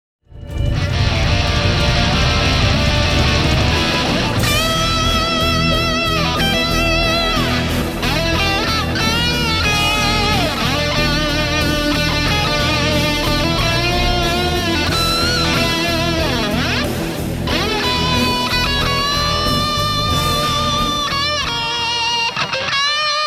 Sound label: Rat